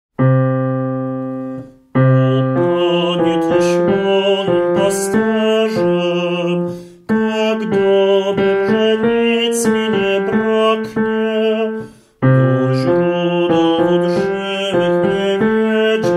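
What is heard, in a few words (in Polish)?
O Panie Tys moim Pasterzem BAS I, chór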